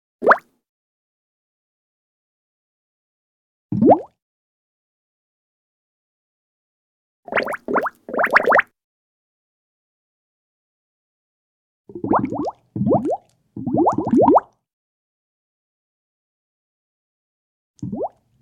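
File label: bubbles popping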